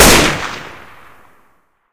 rifle fire; weapons; rifle ak47; gunfire; source engine; left4dead2

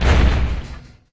lift stop; video games; doomsday; source engine; tf

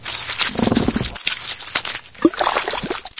barnacle die; Source Engine; video game; npc

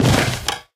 plywood, footsteps

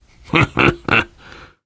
laughter, biker; voice

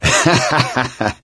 laughter, gambler, survivor voice; source engine; left 4 dead 2

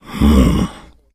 death, infected male; npc; Left 4 Dead 2; Source Engine

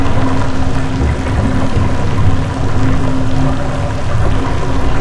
gel flow

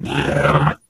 ballbot meh

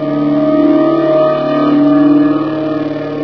zap machine; ambience

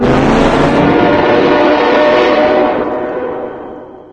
train horn, alarms; video game; razortrain; source engine; hl2

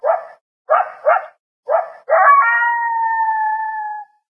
random coyote; video game

